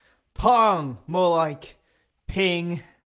Boo; Pitch Black Audio Pong

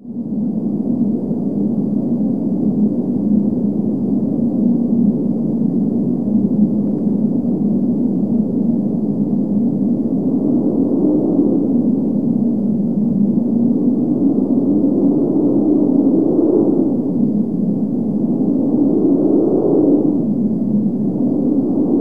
space timewind; best service Blue Box

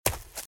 Footsteps - Leaves - Run - Left; RadwinIsland

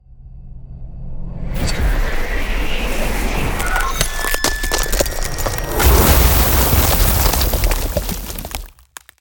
Ice Spell Barrier Defense; Glacier Mantle; Arctic Aura

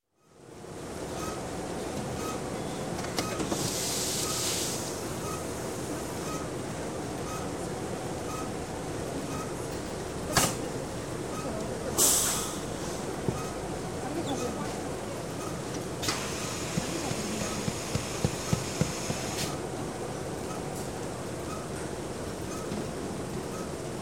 Dry Cleaning Plant Steam Press Voices; Conveyor In Bg; Sound Ideas 2000 Series

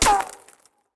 gun miss; Blind Drive

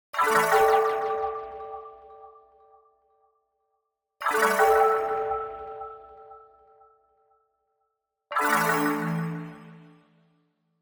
Arcade Click Positive; boom library; The Interface

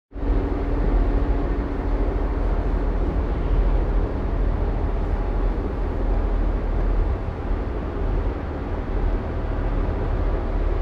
Automobile - Driving On Highway; KMP Film Video Sound Effects

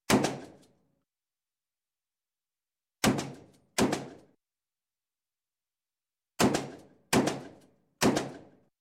Gun, Shot Gun 12 Gauge Single Shot; Sound Ideas 2000 Series

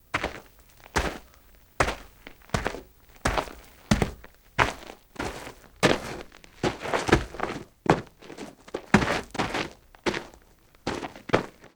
footsteps; carreton; DeadFright

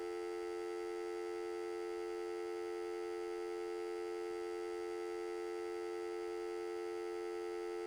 Phone Dial Tone; Home & Office; 1001 Sound Effects

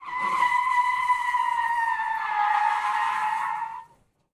skid asphalt; Blind Drive

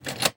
Hospital Door Open; Medical; Blastwave

